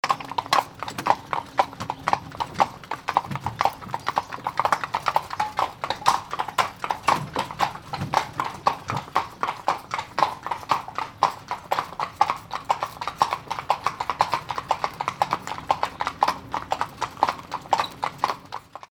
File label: horse drawn carriage moving